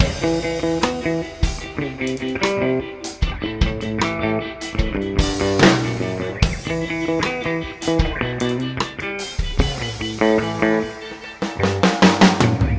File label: loop guitar